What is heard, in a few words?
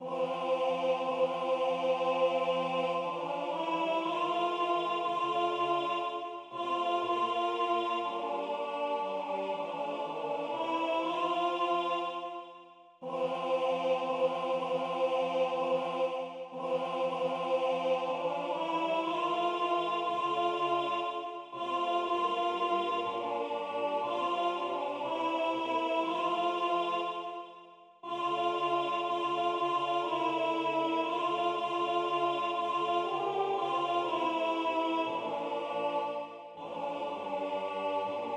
Intercession Alt